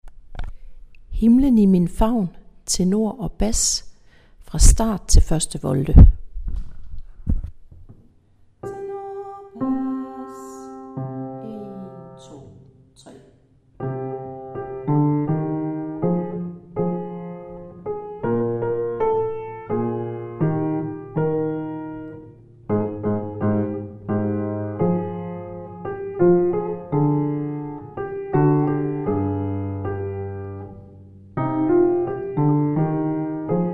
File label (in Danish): Tenor og bas